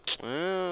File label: tv click